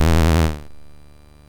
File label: sid MOS6581R2, GAMES; Face Off